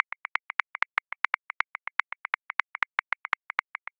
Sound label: 2 Step Accent 16ths, Grooves; Ableton Live 12 Lite; Core Library